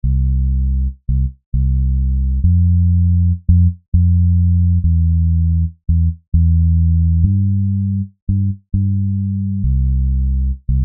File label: Bass